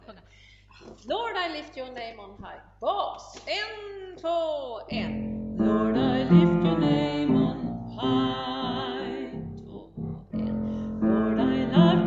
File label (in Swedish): bas; Gospel; Lord i lift